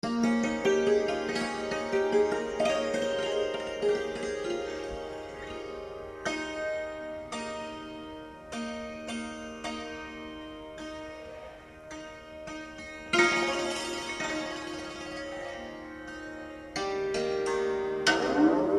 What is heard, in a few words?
santur Bhajan